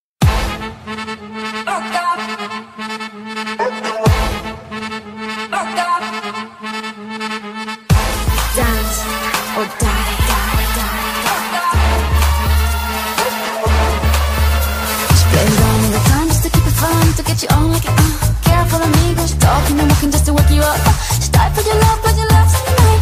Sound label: MUSIC DUET RHYTHMIC GYMNASTICS